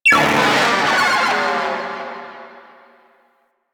Arcade Fail; Nineteeen Ninety Nine; Snake Game

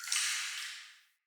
Cave Drip; Caves; Glades Of Andurin

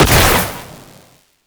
Weapon Fire; Warframe; Moa Satyr

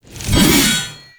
Backstab Impact; Warframe; PaxDuviricus

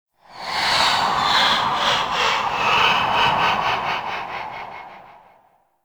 Citrine Void Connector Shriek; Warframe; Citrine's Last Wish

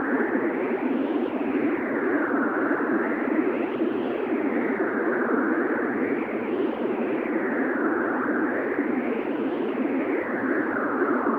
Antimatter Worm Hole Loop; Warframe; Warframes

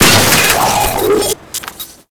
Auto Turret Close; Venus; Turrets

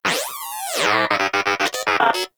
Inquisitive Vocal Enemy Spotted; Pets; Warframe; Moa

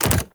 Land Default; Warframe; Pets; Zanuka Companion

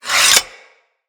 Axe Sheath; Weapons; Foley

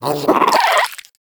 Vocal Idle, Hunting Critters; Nexifera

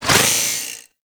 Worker Grinder Whoosh; Warframe; Grineer

